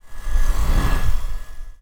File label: Whoosh Hydraulic bomb; MatiasMacSD; Minacious